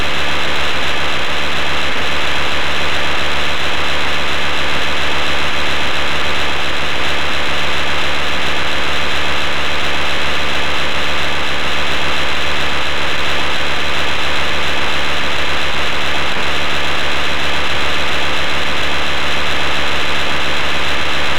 IDEN utah ch2

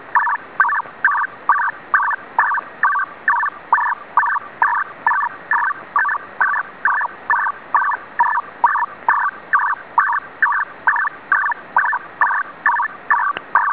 Danish TWPLEX

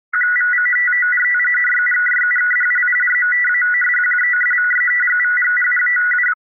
CIS-40.5

